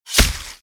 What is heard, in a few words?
impact blade